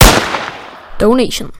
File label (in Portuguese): som de pipoco